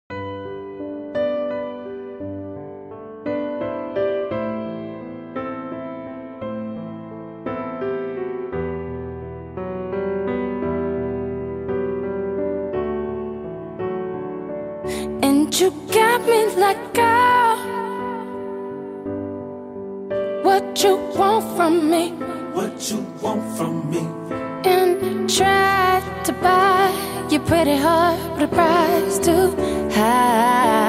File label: Acoustic Version